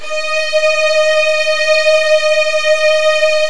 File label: D#2, Virtuoso Strings